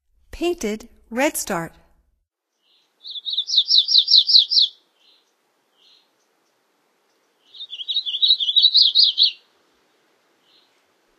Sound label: Painted Redstart, Songs of Birds of North America; Stokes